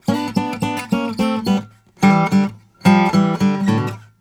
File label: Blues Guitar; ILIO; Fretworks; LICK